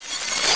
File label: tape Stop